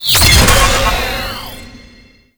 Rescue Captive Trapped Stinger; Tau; Twelve Minute War; Stealth Rescue

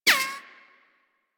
Bullet Impact Ricochet; Warframe; Weapons

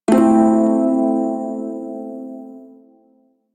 Prime Shawzin Pent Maj Chord K; Music; Warframe

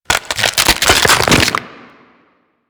Chest Retract; Warframe; Infested; Diseased Ancient; Attacks

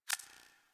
UI Theme Select Confirm; DE; Warframe; Zephyr

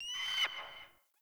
Bird Mountain, ambience; One Offs; Glades Of Andurin

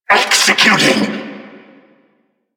attack vocal; Warframe; Duviri; Dax; Vocal Set B

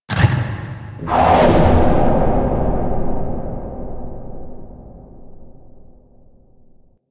Release Fly Out; Archwing Intro Cinematic

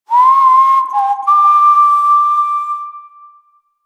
Camp Whistle; Warframe; Quests; The New War; Drifter

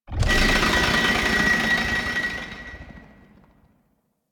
Masseter Whoosh Large

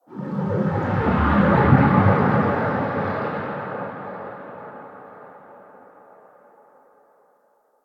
Railjack exterior space waves; Ambience; Warframe

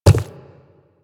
Quadruped Medium Walk Default, Footsteps

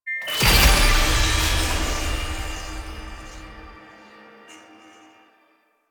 Friendly Obj Comp; Warframe; Nineteeen Ninety Nine; PvPvE

